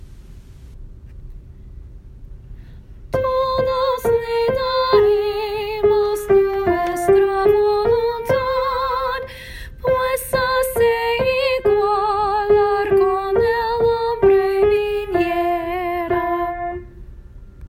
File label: solo